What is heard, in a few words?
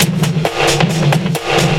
FXBEAT